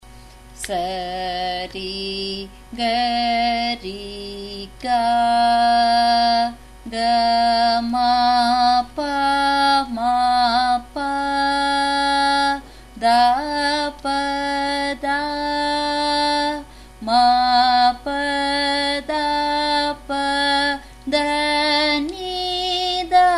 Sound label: Sarali Varise, F sharp